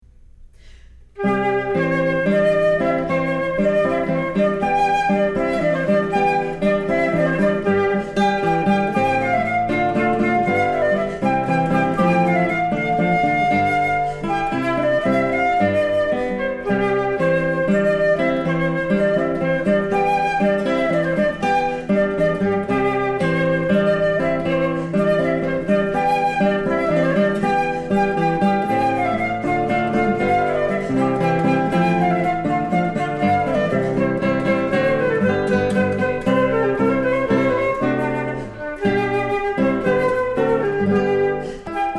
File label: Hornpipe, Classical Duo; Handel; Hornpipe from Water Music